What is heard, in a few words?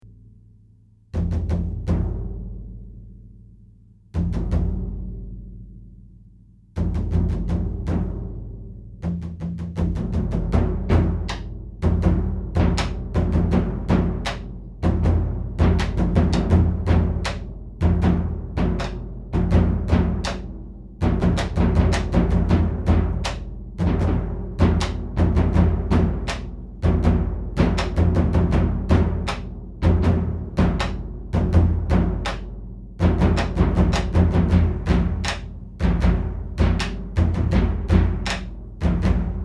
Epic